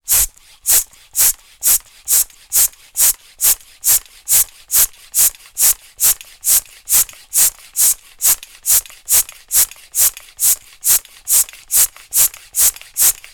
transport bicycle hand pump operating med speed valve end